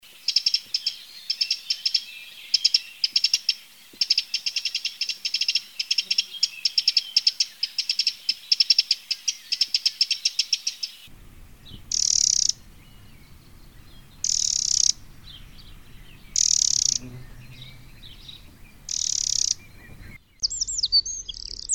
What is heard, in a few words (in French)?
Troglodyte, chant